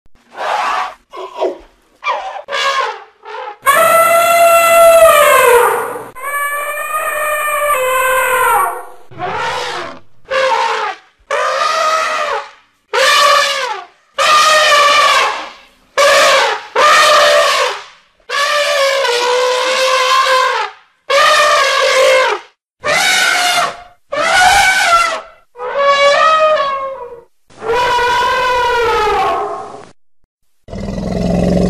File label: the sound of screaming elephant; zvuk krika slona